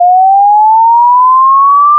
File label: ascending